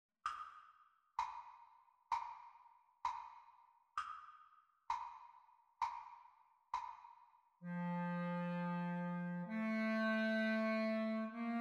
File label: Practice speed Recording Clarinet Duets